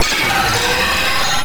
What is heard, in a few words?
weapons